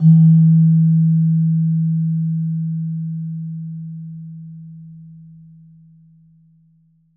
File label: PELOG D#1, PELOG BASS; Soundscan vol.60; AKAI